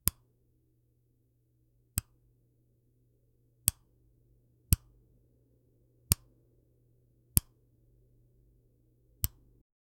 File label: soft pop sound, button